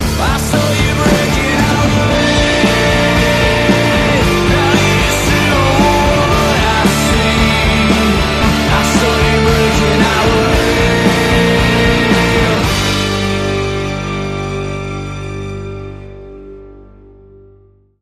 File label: Bumper